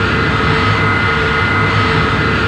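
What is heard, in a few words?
idle loop, airplane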